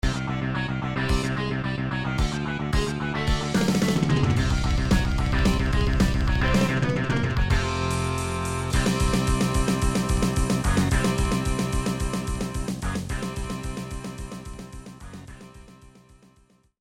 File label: thrash